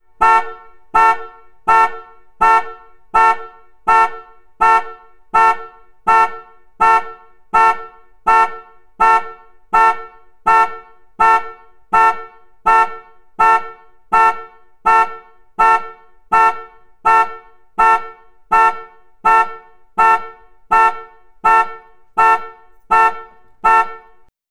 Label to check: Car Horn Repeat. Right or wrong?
Right